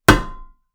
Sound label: Metal Impact